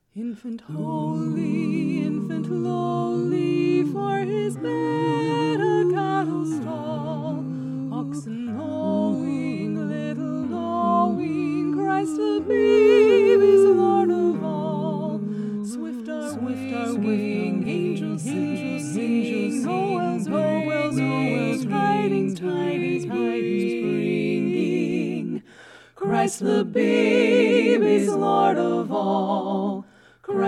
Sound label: SATB; Infant Holy, Infant Lowly